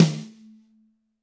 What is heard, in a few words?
Snare, Roland TD-20